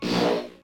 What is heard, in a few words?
pop